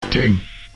Birdy ding

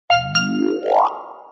notification

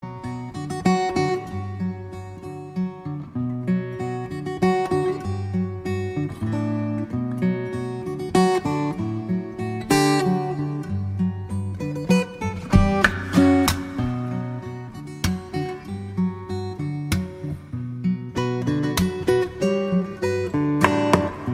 Solo Guitar

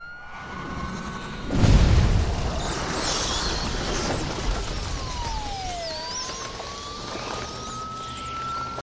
Bats

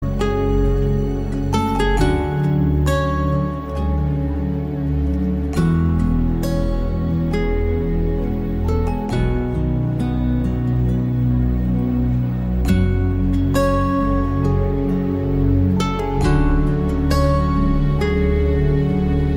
Music Theme; Dangal

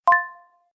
Beep

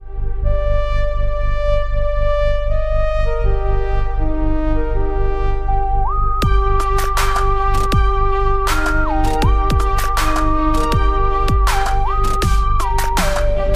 Dub Step; Geek

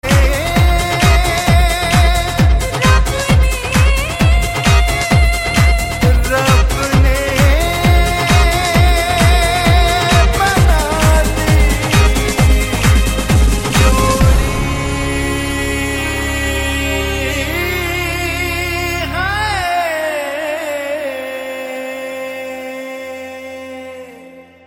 Disco Mix

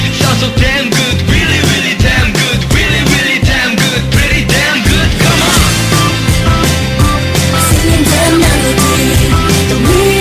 Melody

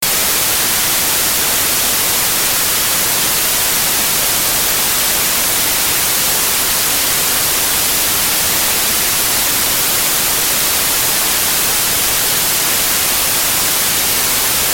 White Noise

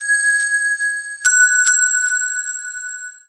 Notification Tone

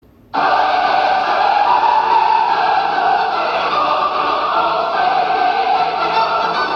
Game Win; Evil Apples

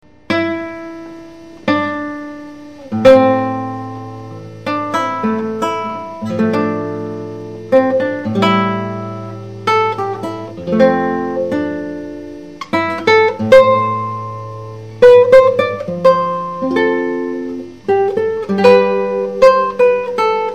Classical; MGS

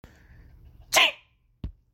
Jeep

